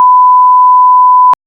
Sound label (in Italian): tono basso e breve tipo; non-aggressive